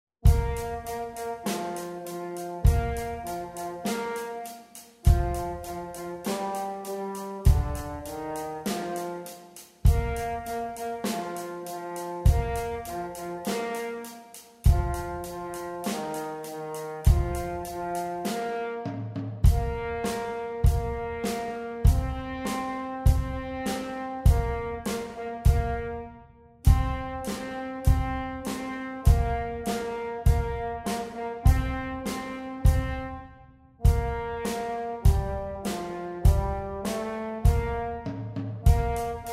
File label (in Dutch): Rock Hoorn